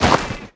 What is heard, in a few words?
avian pops move; Stellaris